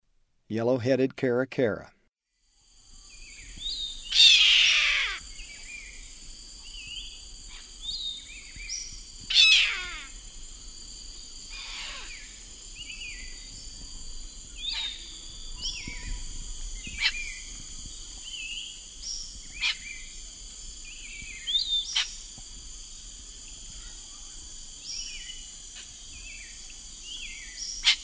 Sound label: Yellow-headed Caracara; S. America; Eastern Lowlands